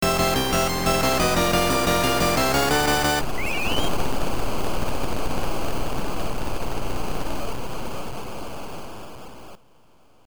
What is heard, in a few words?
Score Point; super volleyball; turbografx 16